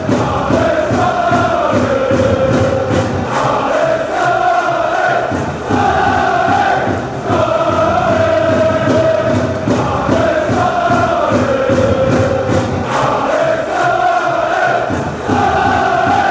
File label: allez standard allez, chants